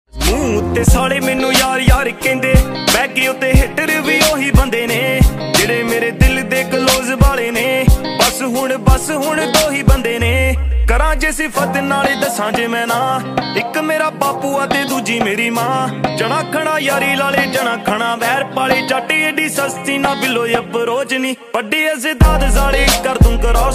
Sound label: Punjabi Ringtone